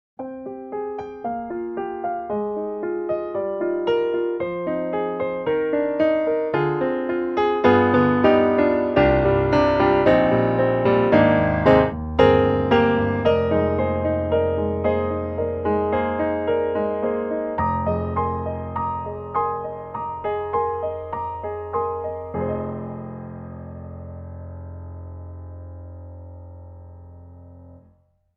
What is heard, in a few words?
Piano; Cinesamples; Piano in Blue